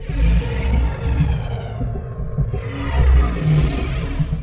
stellarites idle breath; Stellaris; guardians

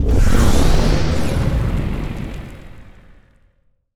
necroid ship engine; Stellaris

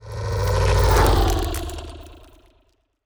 pro ship weaver move; biogenesis

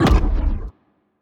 anti fire rate laser hit, weapons; biogenesis

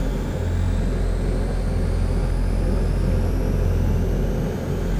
colossus windup; aquatics

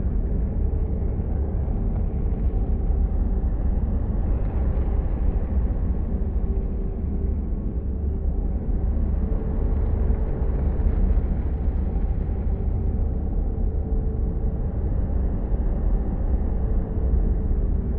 ship science ship idle; aquatics

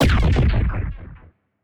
titan hit explosion tonal, weapons; kaiju; Stellaris; biogenesis

